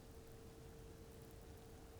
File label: pulses, BALAENOPTERA; MONACO 2022-07